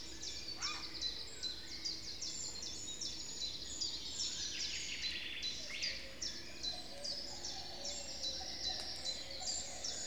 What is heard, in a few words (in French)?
oiseaux; lorraine reine et catena